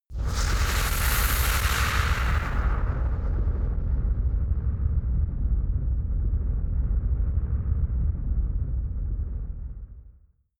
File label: air jet burn distortion